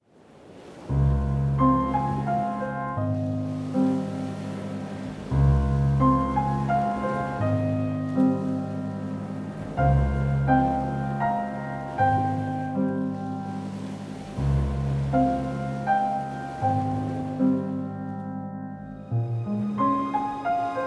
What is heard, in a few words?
Silky Whisper; Samsung Galaxy S20; ringtones; Calm